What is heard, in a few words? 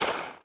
Sphinx Nose Rasp; Playable Tutorial Level